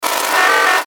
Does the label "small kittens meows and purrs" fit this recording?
No